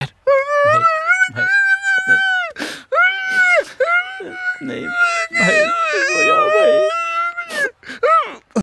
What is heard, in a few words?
Crying Funny; Bollywood Meme